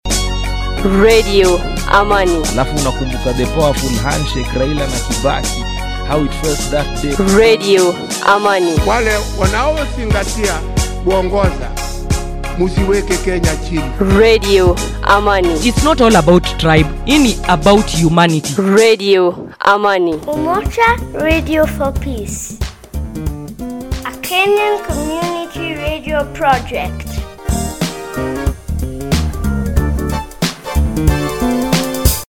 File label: Jingle; KOCH FM